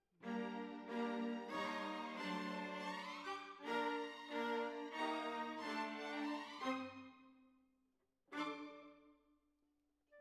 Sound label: Violas Over